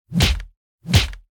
Headbutt; LOOPERS

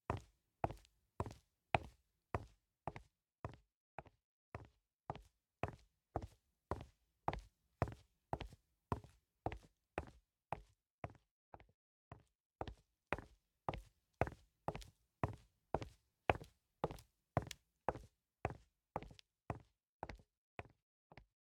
Walk Around; LOOPERS

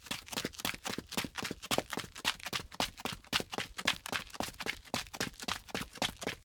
Footsteps Loafers; LOOPERS

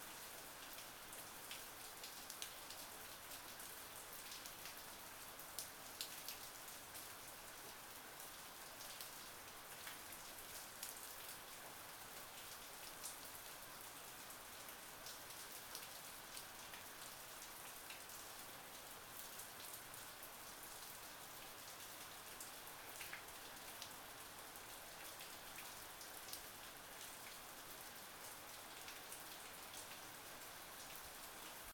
Light Rain; LOOPERS

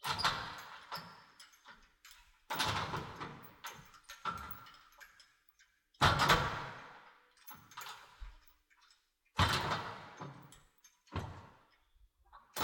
Training; LOOPERS

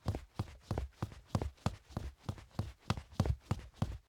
Footsteps Boots; LOOPERS

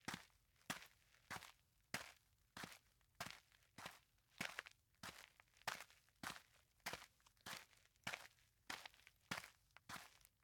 Footsteps; LOOPERS; Tyler

